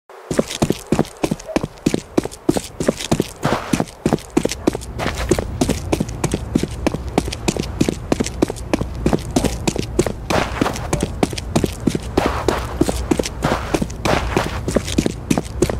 counter strike walk

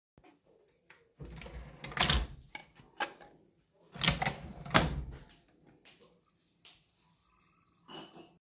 Blank cassette tape; نوار خالی

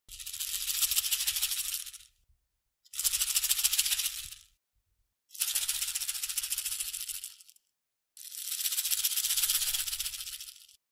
shaker

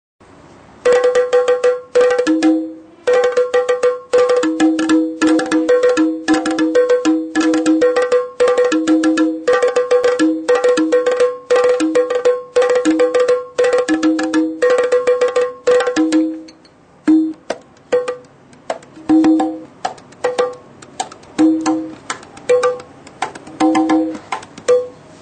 Nagara, ساز ناقارا

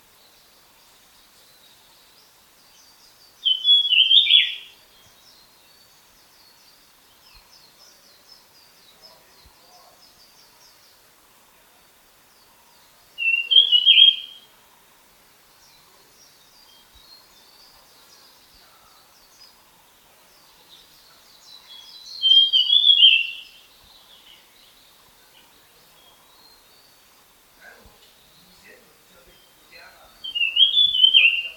Dusky fulvetta

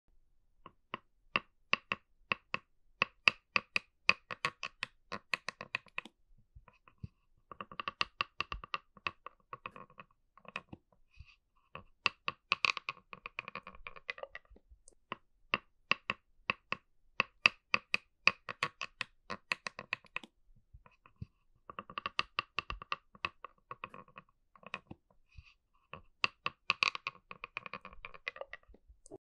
gnash; دندان قروچه

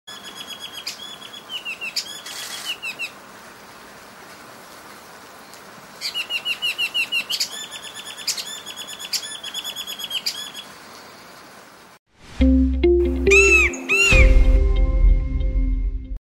Babax lanceolatus; پرنده باباکس چینی

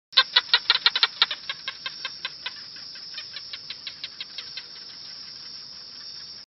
Green iguana

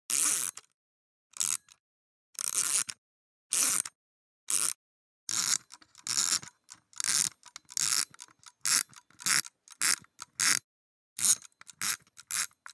wind clock

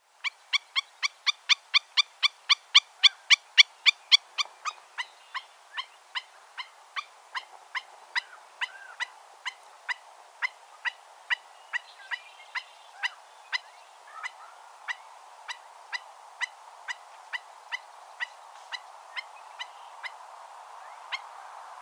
Stilt

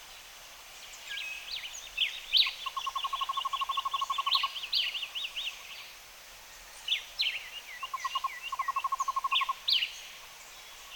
Cuban trogon

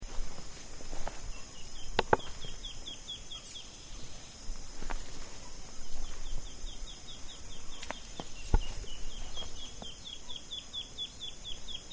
Muscovy duck; اردک مسکویی

